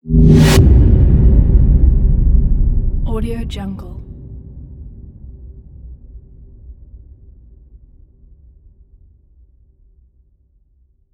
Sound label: space blaster impact; sinematic 2; soundmorph